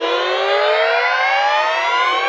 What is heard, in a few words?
HATCH OPEN; ILIO